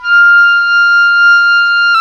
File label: WND OBOE, Oboe Room; Roland L-CDX-03 Disk 1; Oboe 4-8